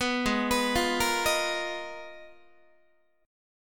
G sharp Minor 6th Add 9th G sharp Guitar, chord; G#m6add9 arpeggiated; 14,16,14,15,14,14; JGuitar

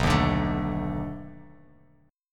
B 7th Sharp 9th, down, Bass Standard; strummed

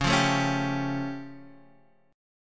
A Augmented 7th C sharp

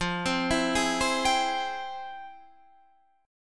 F Minor Major 9th Guitar Half Step Down

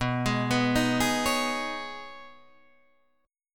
B 6th Add 9th B Guitar Open D, chord

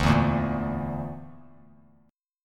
D Suspended 2nd Sharp 5th D, Bass Standard; Dsus2#5; strummed; JGuitar

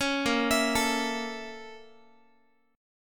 A sharp Minor Major 7th Flat 5th A sharp Guitar

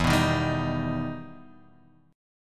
E Minor Major 7th E down

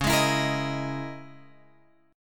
C sharp Minor 6th Add 9th C sharp; C#m6add9 Chord {9 11 X 9 11 11} strummed

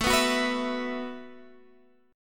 E Suspended 2nd Suspended 4th A

